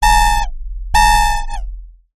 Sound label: science fiction robotic drill burst; zapsplat